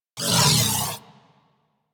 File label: Crewship Turret Proj By, Corpus